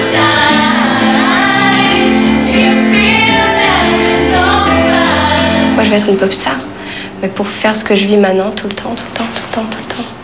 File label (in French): interview chant; popstars; Sons des passages dans l'émission